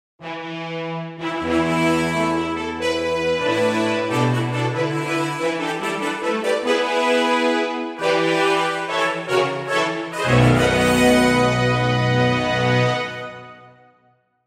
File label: brass extended full brass